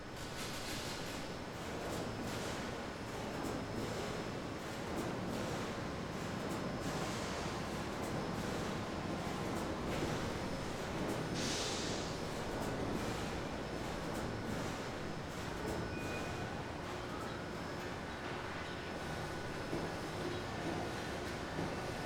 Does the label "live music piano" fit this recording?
No